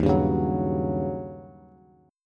Eb 7th Sharp 9th, Eb, Bass; Eb7#9 strummed; 11,10,11,11; JGuitar

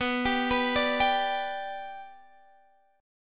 B Minor Sharp 5th, Guitar, Open D

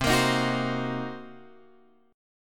C Minor 7th Flat 5th Guitar Open F, chord; Cm7b5; strummed

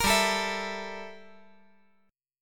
G Minor 6th Add 9th G down

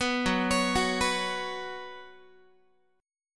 Gb Suspended 4th, Guitar; Gbsus4 arpeggiated; 14,14,16,16,15,x; 4A,4E,5A,5D,5G#,6C#

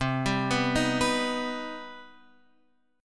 C Minor Major 7th down Guitar Open F; arpeggiated; JGuitar

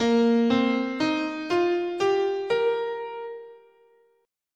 Asharp Yo

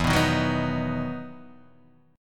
E Major 7th Suspended 2nd, Guitar, Half Step Down

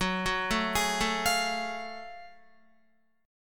F sharp Minor Major 9th F sharp Guitar; arpeggiated

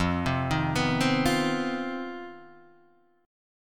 C Minor Major 7th Double Flat 5th F, chord

